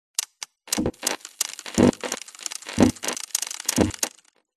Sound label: science fiction steam punk machine operate clicks fizz clunk; audio mangler